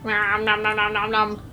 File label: Ommmnomnomnom; stream noises; Micro Pack